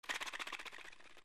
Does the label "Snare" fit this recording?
No